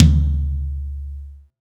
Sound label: acoustic tom; acoustic drums